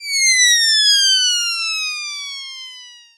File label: falling